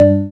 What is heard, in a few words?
Bass G, Offbeat Bass; Club; VEC1